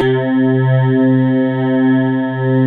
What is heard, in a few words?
Dance Floor Bass, Multisample Basses